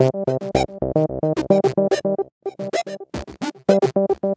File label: philter (acid tweak); Sonic Foundry (Sony Creative Software); Ugly Remnants VOL-2